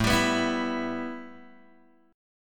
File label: A 7th Suspended 2nd, A, down, Guitar, Open G